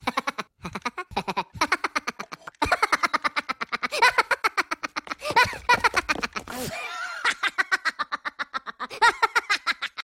Funny Minion Giggles

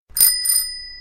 Bike Bell

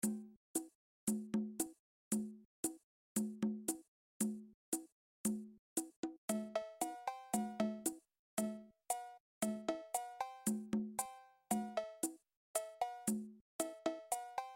Jungle Vibe

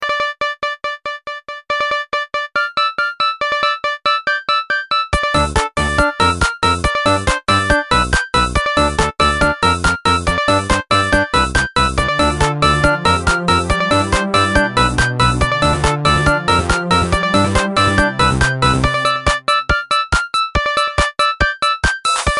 Super Fancy Beats

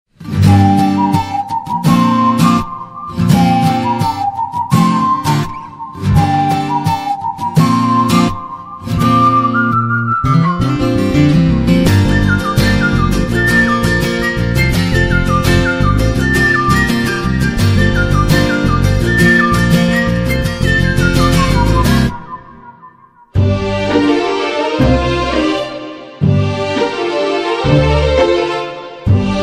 BGM

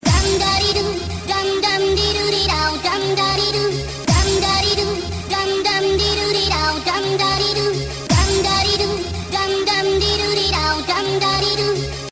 Anime Techno

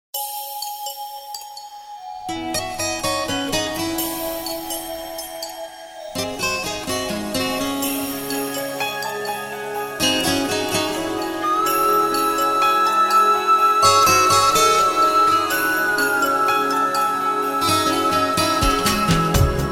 Love tone

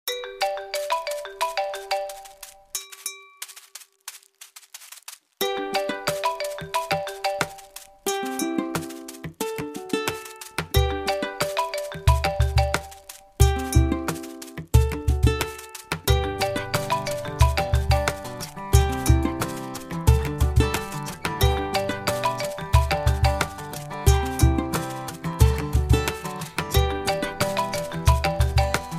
iphone marimba mix

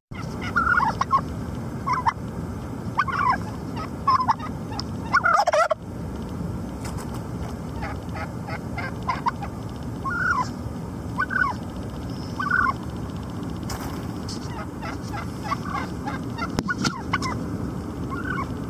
Turkey Ringtone

